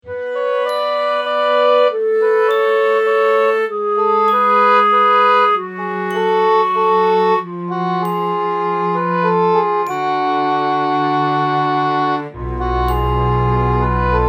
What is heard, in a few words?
orchestral walk